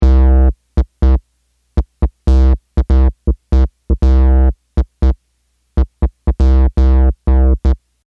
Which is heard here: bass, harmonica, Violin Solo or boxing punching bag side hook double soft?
bass